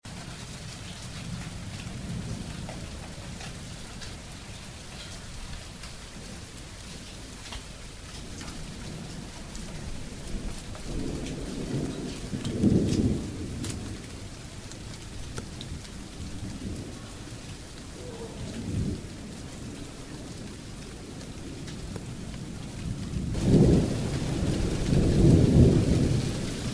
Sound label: CRICKI ambient soundfield recording, soundscape